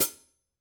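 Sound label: signature precision 14 sound edge hi-hat chick, cymbales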